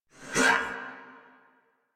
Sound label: Attack Effort; Warframe; Dax Knight; en